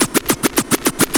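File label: SCRATCH TOOLS; AMG Megabass REMIX! VOL-2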